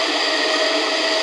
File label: Rub, train; nankai6000; OpenBVE